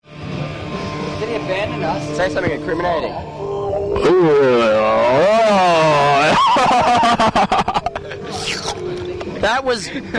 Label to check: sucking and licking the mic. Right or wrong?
Right